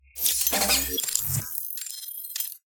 Droid Transformation; Unreal SFX; Battle Droid

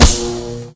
stunstick flesh hit, weapons; hl2

